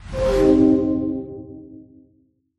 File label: lose; tetris